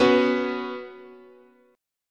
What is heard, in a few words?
B Suspended 2nd, chord, guitar